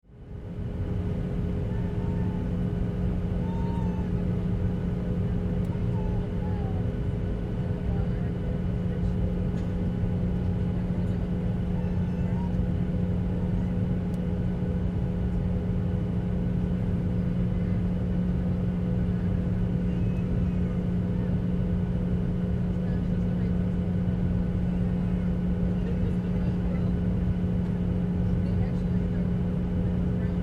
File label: Airplane Interior Commercial Airline; Vehicle; Airplanes